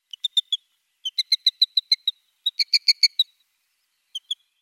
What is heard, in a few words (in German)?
Kuestenseeschwalbe Sterna paradisaea; Vogelstimmen